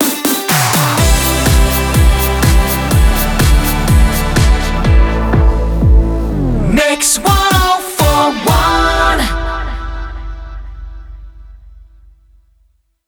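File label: 124bpm MIX Ramp, jingle; WBMX 2017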